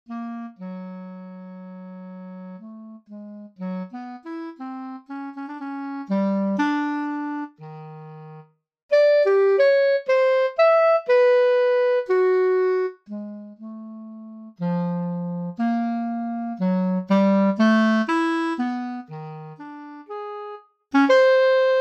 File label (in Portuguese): carinete solo